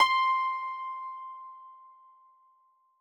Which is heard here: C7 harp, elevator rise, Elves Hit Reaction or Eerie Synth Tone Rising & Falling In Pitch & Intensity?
C7 harp